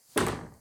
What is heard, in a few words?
Door kick